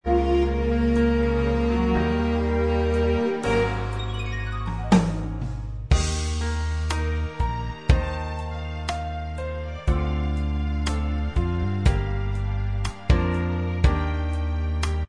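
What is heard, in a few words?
instrumental, backing track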